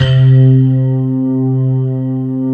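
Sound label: harp shake C2; Synth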